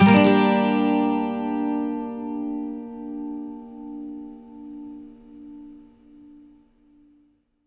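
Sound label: 12 string minor, Acoustic Guitar Loops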